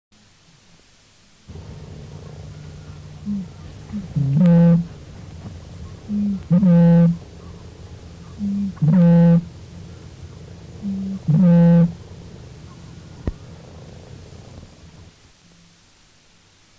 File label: Bittern; Dick